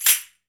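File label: CARNIVAL SHAKER, Latin Shakers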